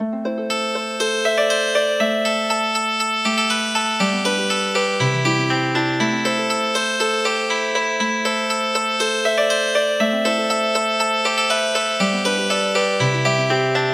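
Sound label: Healing Harp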